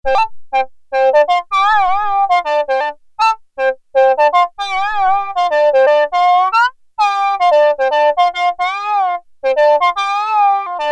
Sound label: Reedy Solo; Sequential Circuits Prophet-5 (Ver.3); Factory Patch; File 2 Programs